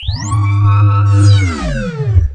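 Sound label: super shield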